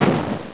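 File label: laser hit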